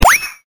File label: cartoon split